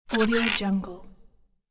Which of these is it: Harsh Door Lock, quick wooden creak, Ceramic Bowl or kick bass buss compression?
quick wooden creak